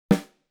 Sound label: snare